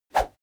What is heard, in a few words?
whoosh small; swooshes-swishes